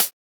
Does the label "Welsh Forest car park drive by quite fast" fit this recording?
No